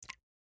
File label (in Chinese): 滴水石锥水滴落声